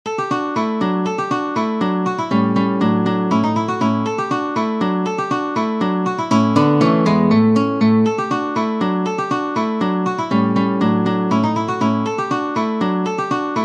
Allegro Grazioso, guitare; Ferdinando Carulli; Op. 241 Méthode pour la guitare

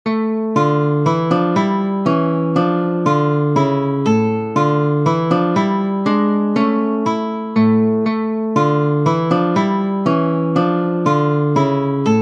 Andantino Grazioso, guitare; Ferdinando Carulli; Op. 241 Méthode pour la guitare

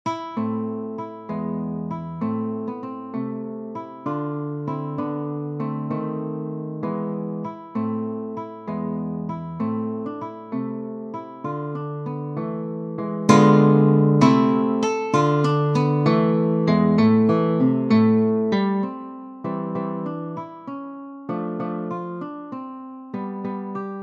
Andantino, guitare; Ferdinando Carulli; Op. 241 Méthode pour la guitare

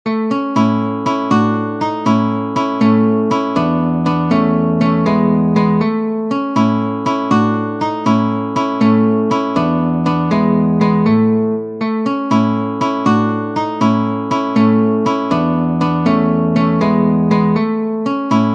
Poco Allegretto, guitare; Ferdinando Carulli; Op. 241 Méthode pour la guitare

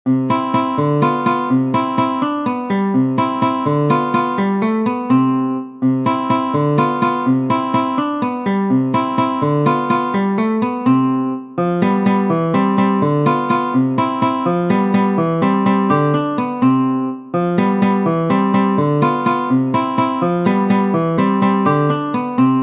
Walze, guitare; Ferdinando Carulli; Op. 241 Méthode pour la guitare

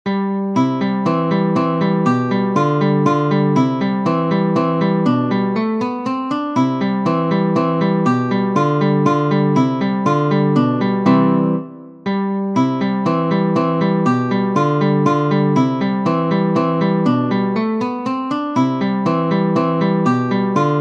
Walze, guitare; Ferdinando Carulli; Op. 241 Méthode pour la guitare

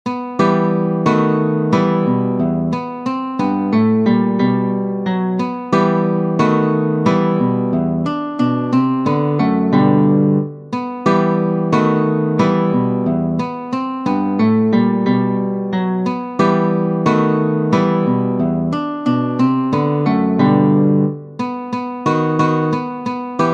Larghetto, guitare; Ferdinando Carulli; Op. 241 Méthode pour la guitare

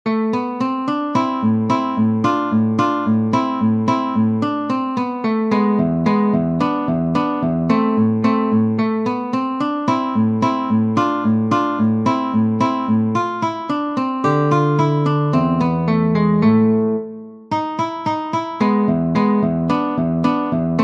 Andante, guitare; Ferdinando Carulli; Op. 241 Méthode pour la guitare

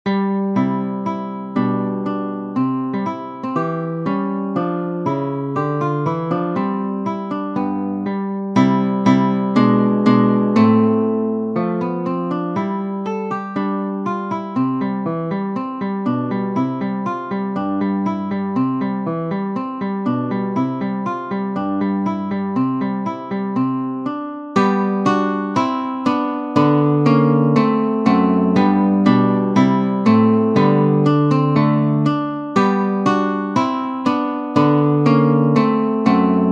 Andantino, guitare; Ferdinando Carulli; Op. 241 Méthode pour la guitare

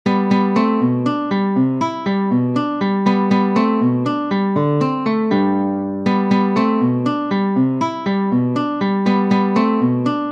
Walze, guitare

